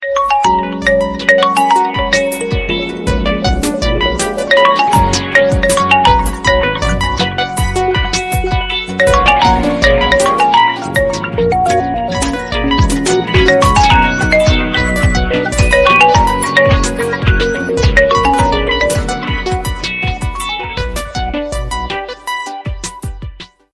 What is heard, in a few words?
Vivo ringtone